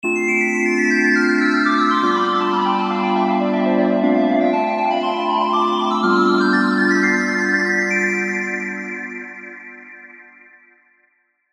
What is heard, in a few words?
Spectral Audience, keys; revealed diva keys vol 2